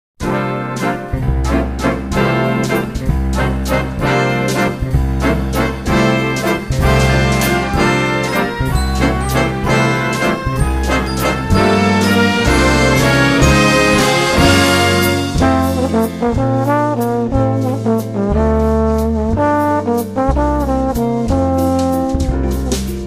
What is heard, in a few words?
jazz